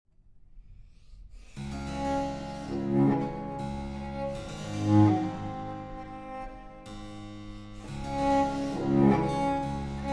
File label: Les Folies d'Espagne (Transcribed For Cello & Harpsichord); Marais